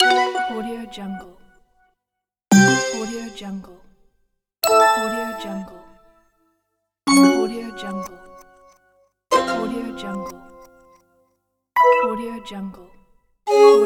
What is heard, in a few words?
notification